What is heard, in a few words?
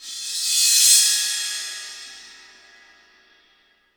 IMP CYMBAL, Cinematic Impact